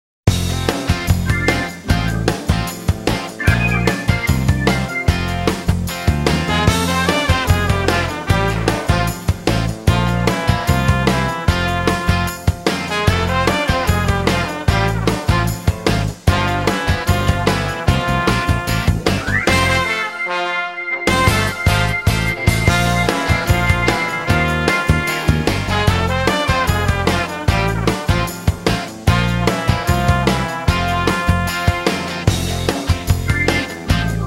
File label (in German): trompetentaxi